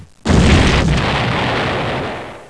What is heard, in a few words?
Artillary exp